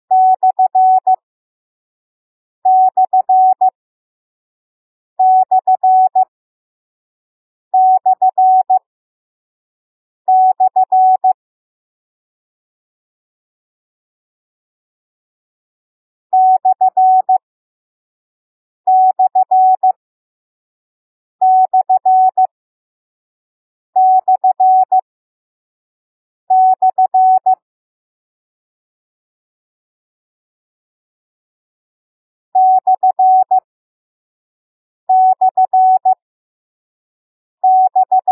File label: Punctuation Slash DN